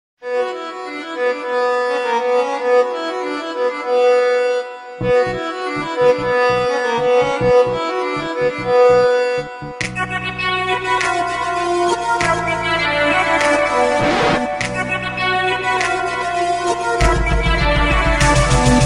Piano Mix

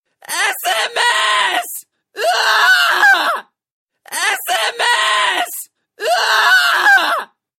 Sms Ahhhhhh

